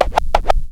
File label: Scratch In, vinyl record